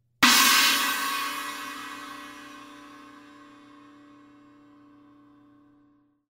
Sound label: S China Crash, cymbal